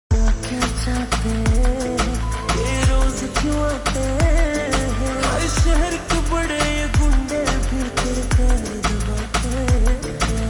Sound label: Slow Reverb Version Ringtone